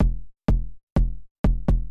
kick, house, 125; eJay; Drum

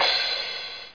LOW CRASH; Dark Forces Demo; Dark Forces Instruments 1

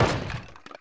kit fall; Soldat

